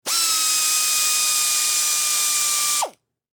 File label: Industry Air Ratchet Operate; Power Tool Sound Effects; Power Tools (Sound Effects:Tools); SFX Bible 6